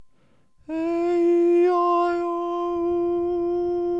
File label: aeiou High